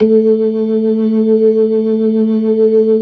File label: LO MAN V L, ORGAN LOWER MANUAL